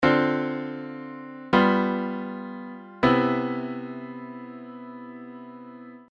II V I three voices 2nd inversion, jazz chord progressions